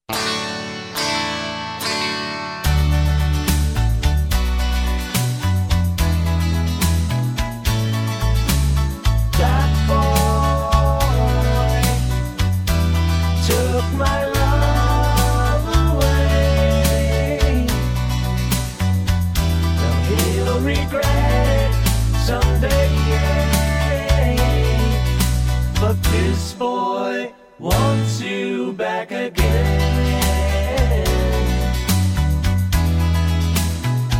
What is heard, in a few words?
With Backing Vocals